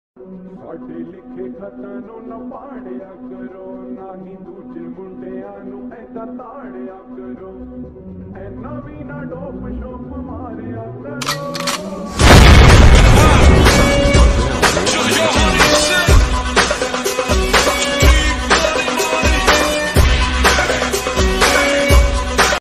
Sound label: slow reverb hindi ringtone